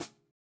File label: SNARE